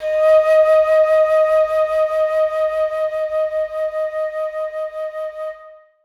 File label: flute d#4; Sonatina Symphonic Orchestra